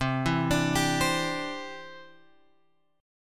C-Major Add 9th, guitar; Cadd9 Chord {8 7 X 7 8 8} arpeggiated

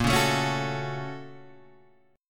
A sharp Minor Major 7th Sharp 5th; A#mM7#5 Chord {6 4 7 6 7 5} strummed

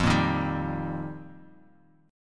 F 7th Suspended 2nd Suspended 4th; F7sus2sus4; strummed; JGuitar

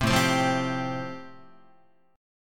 A-Minor 7th Sharp 5th, Guitar, chord; Am7#5 strummed; JGuitar

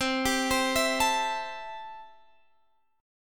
C 6th, C, down, Guitar, Standard; C6 arpeggiated

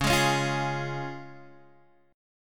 C sharp Major, Guitar Half Step Down

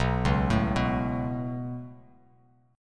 B 7th Suspended 2nd; B7sus2; arpeggiated; JGuitar

